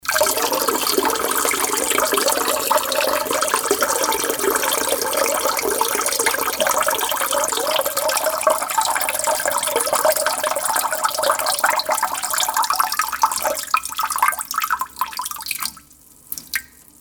WC Geraeusche

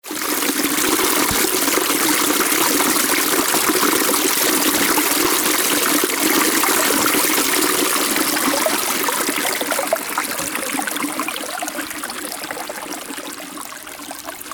Anna Brunnen Deggingen Rundschwenk

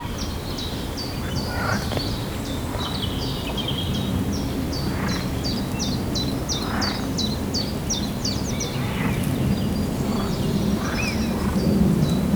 Vogelgezwitscher Wald Froschteich

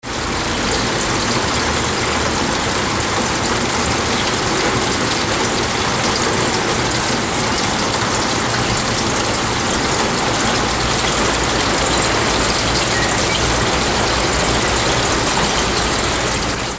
Sommerregen unter Dach

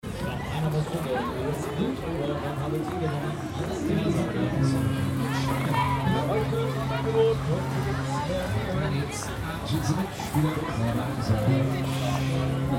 Rummel Menschen Ansage Gemurmel

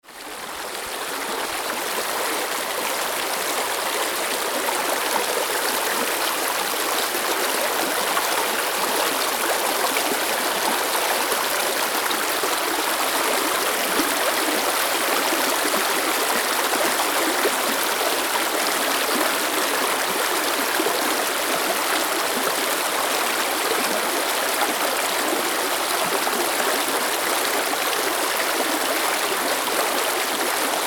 Bach bei Grassoden Kirche

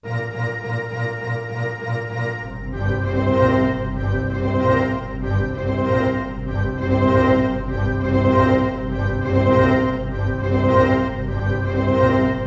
Orchester Verlaufsmusik

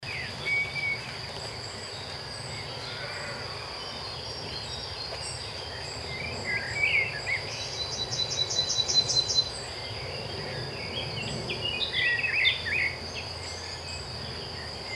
Waldrand Blumenwiese Zirpen Voegel

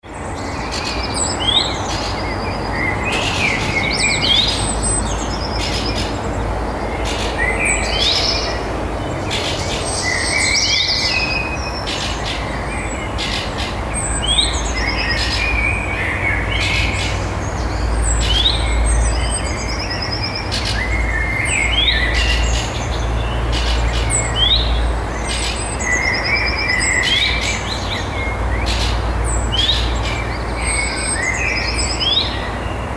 Voegel Amsel Elster leise Autos

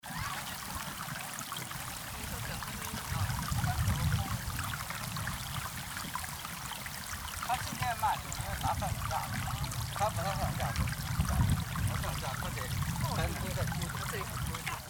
Geysir Plaetschern Stimmen

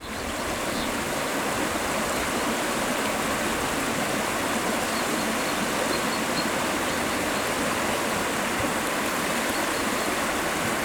Bachrauschen Filsquelle mit Vogelzwitschern; Aufnahmen von der Filsquelle

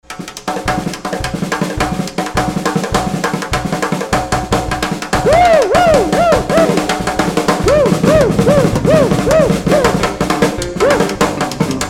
Drumsolo

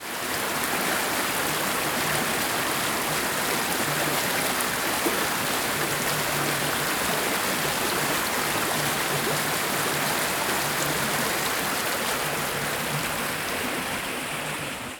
Bachrauschen Filsquelle; Filsquelle 01

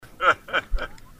Lacher

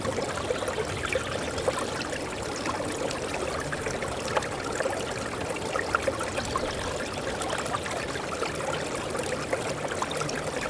Bachrauschen Filsquelle; Aufnahmen von der Filsquelle

